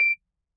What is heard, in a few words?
beep; civ13; civ14